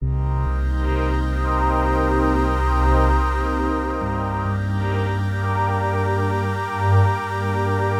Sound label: 120 Cm midnight 90's; Loops; Touch Loops; BlissedOutBeats